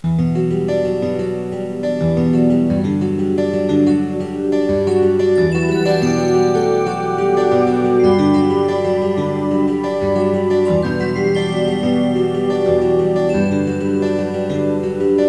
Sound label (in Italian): suonerie musica pop